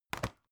folder close

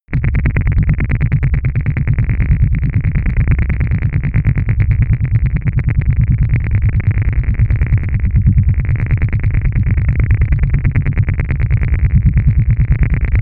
atmosphere bubbling engine

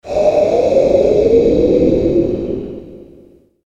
breath cold phasing

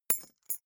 key ring small drop carpet soft

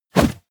push

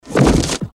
destruction body impact

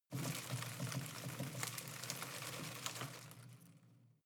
home plant leaves rustle rattle long

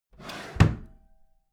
empty drawer smooth close heavy

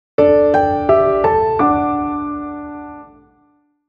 simple piano

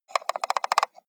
computer mouse wheel scroll slow

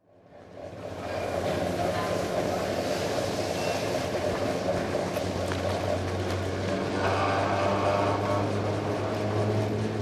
cable car at top station

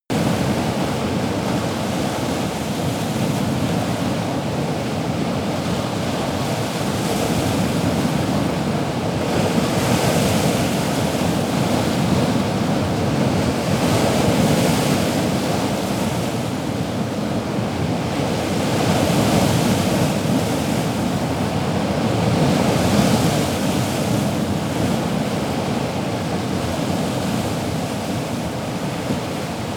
waves stormy

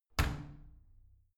washing machine slam door

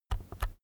keyboard external key press slow soft

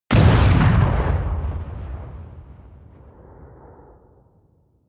missile small explode close

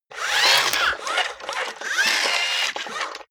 robot movement long

